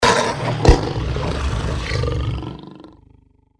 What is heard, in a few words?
deathclaw chase